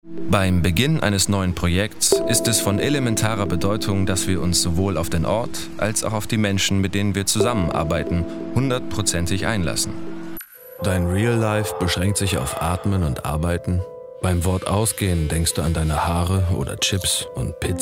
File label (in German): Dokumentation Medley